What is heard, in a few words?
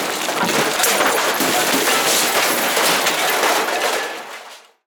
demolition, debris crash